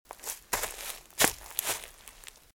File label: Footsteps - Leaves - Shuffle; RadwinIsland